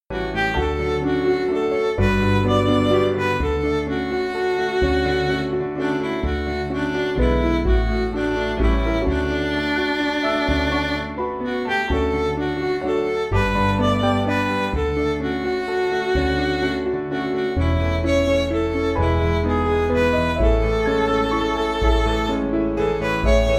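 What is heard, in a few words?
Midi, Backing Files for Churches; Theres A Garden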